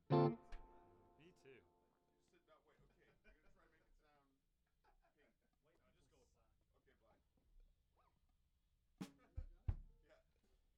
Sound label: Rough Mix L